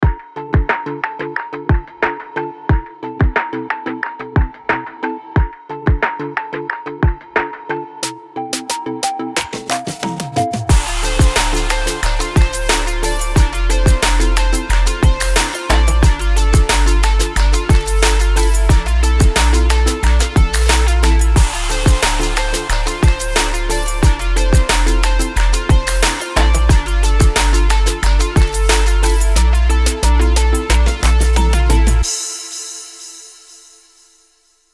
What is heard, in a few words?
MUSIC BED POP BREAKS UPBEAT HAPPY DANCE